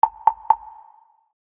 A Message, notifications; Gigaset GS290

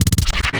notifications; RED; Hydrogen-One

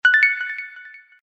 notification; Hisense; E602M

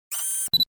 camera focus; LeEco Le 2

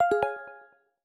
Playful, notifications; Honor 600 Pro China

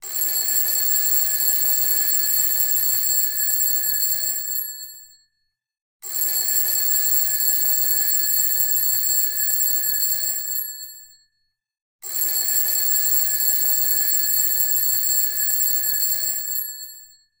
Schedule Vintage Clock; LG K83 5G; ringtones

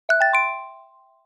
Quaint; BQ 5731L-Magic-S; notifications; BQ Russia

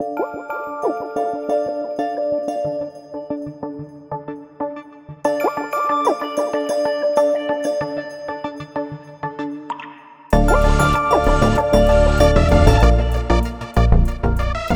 Wah Sounds, alarms; Honor; Magic-7-RSR-Porsche-Design

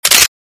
Camera Shutter; Samsung SGH-F300-Alt

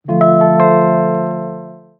Notification

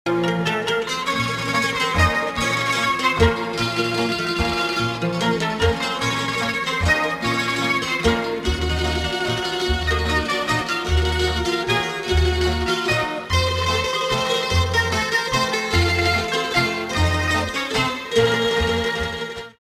Filipino Folk; MyPhone my96 DTV

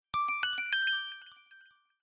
Lightly, notifications; Mobicel; Onyx

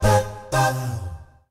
A Cappella, notifications; Meitu V4s

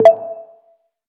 Notice, notifications; Elephone U Pro

